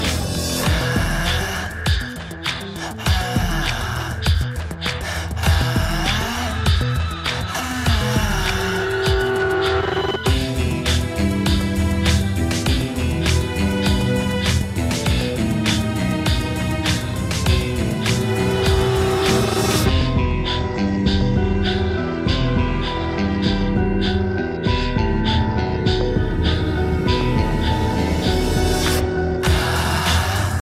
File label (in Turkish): Jenerik Muzigi